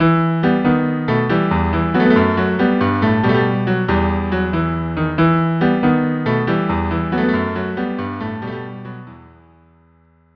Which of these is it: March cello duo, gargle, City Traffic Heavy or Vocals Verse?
March cello duo